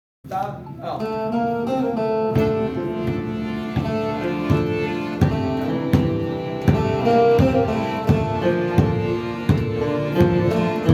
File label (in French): guitare